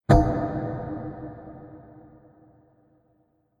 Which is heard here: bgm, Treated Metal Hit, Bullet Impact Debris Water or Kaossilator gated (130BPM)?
Treated Metal Hit